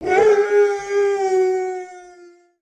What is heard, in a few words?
Pet Doberman Howl; creatures pets